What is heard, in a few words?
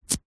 office blue tack adhesive putty pull remove from surface